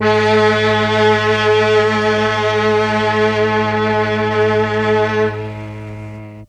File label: MEL STRING; ILIO; Propeller Island Legendary M400